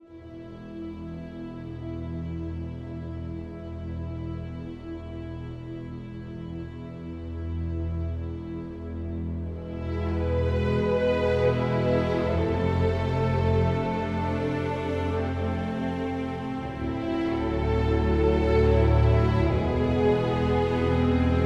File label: 84varbpm 4-4meter; Planet of Lana